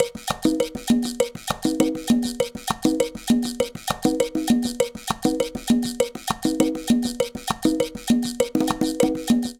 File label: Cumbia 100bpm, Latin Percussion; Ableton; Factory Packs